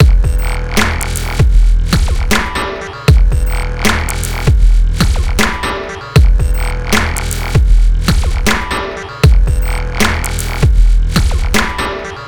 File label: Glitchy Loop